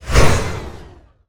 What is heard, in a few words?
spl mysticism fail